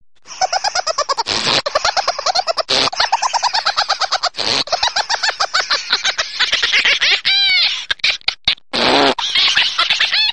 Lachen

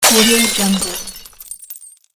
Fensterbruch

